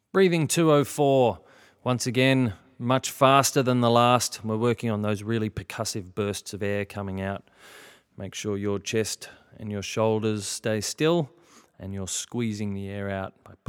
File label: breathing, singing